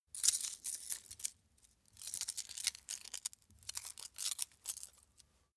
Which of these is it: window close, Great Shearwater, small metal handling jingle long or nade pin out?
small metal handling jingle long